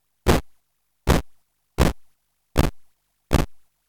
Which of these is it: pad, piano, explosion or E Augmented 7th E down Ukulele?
explosion